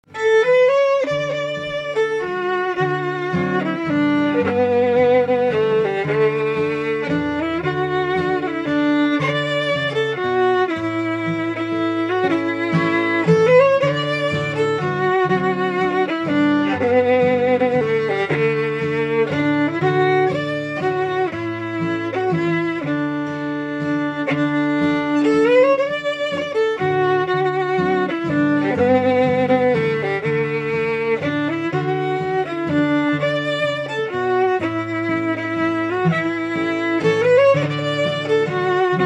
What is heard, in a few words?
Waltz